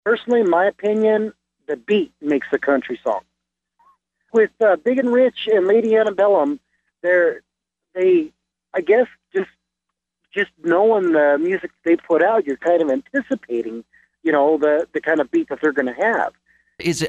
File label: country songs